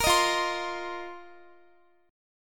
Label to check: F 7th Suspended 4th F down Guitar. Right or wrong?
Right